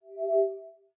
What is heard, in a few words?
window close; Nokia; 770 Internet Tablet